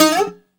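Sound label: BZ GLIS UP E, bouzouki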